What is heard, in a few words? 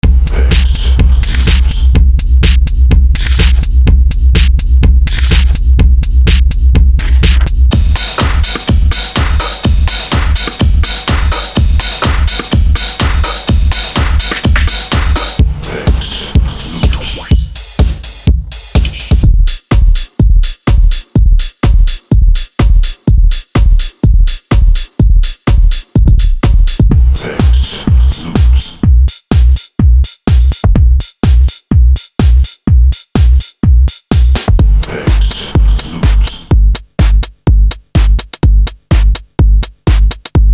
edm drum samples loops